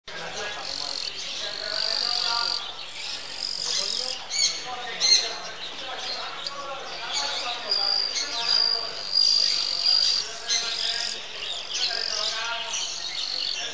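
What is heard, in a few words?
katydid, petshop; kirigirisu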